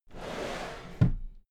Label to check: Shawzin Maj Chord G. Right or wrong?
Wrong